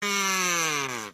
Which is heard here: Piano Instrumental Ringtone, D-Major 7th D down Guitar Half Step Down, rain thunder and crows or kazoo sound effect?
kazoo sound effect